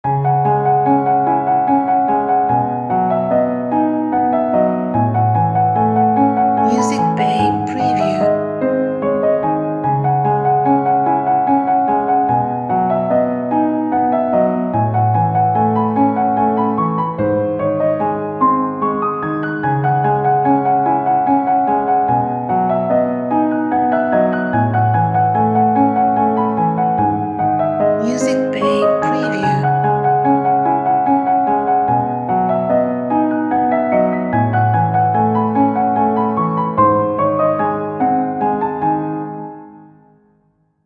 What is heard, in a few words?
Elegant Style